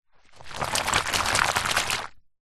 household bowl foamy bubbly water swish hand movements